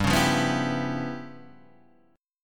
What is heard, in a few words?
Db 7th Sharp 9th Flat 5th G